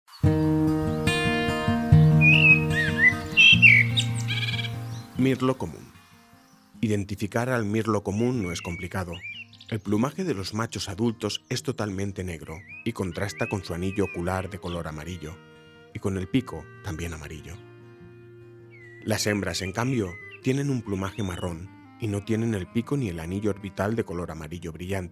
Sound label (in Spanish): mirlo, aves; lavanderas